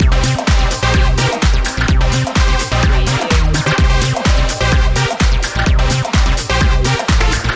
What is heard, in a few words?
beat loop